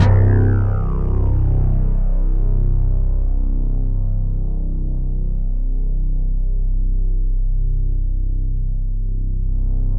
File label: C1, Synth Line; 90 s Sample CDs; NiceBeats; SynthLine VOL-27